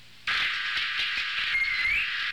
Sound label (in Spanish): Sonido titi amamantando a sus crias